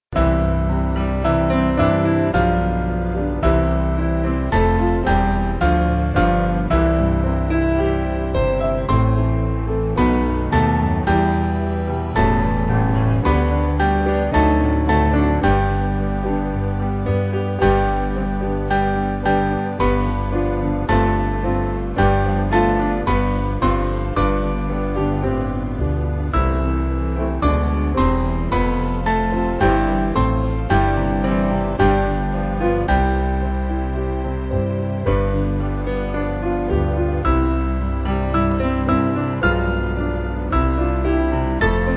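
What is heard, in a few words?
Band, Music Backing; Spirit Of God; Morecambe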